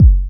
puffy bass drum sound g key, Royality free bass drum single hit tuned to the G note